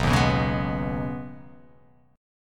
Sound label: B 6th Add 9th B down, chord